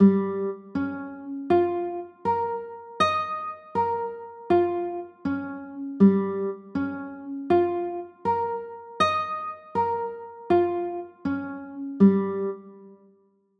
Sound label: e flat 9, chords